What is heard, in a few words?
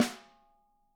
wood; Sampleheads; Peter Erskine Living Drums